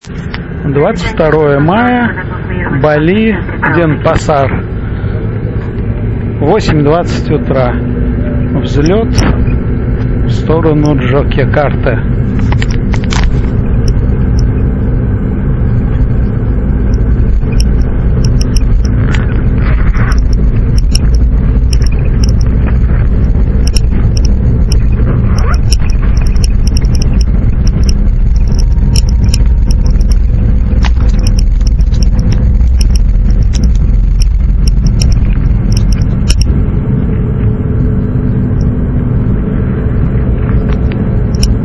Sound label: indonesia sounds; geopoesia